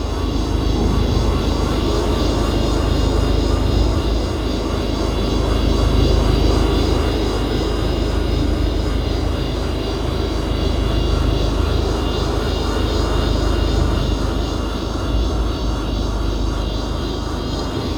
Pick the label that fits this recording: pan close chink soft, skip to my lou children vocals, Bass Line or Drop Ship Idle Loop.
Drop Ship Idle Loop